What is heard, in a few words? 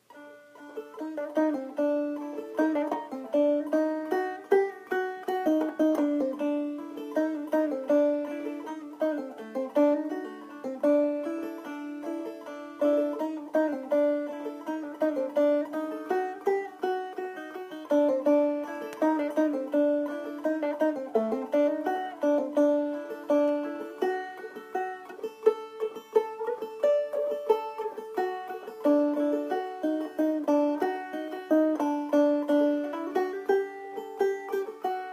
Banjo